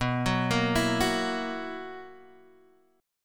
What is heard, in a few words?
B Major 7th Suspended 4th B, Guitar, Standard; arpeggiated; x,2,4,3,5,2; JGuitar